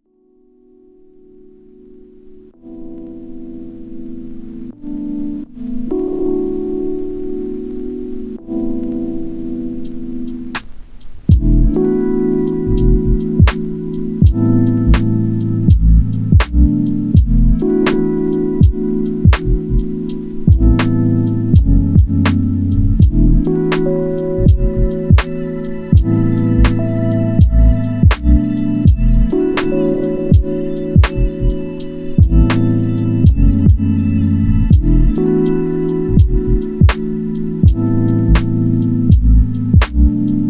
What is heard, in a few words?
lofi hip hop